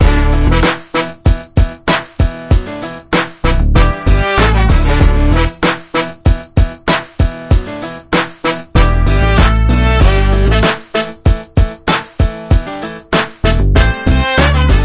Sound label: Hip Hop; Smite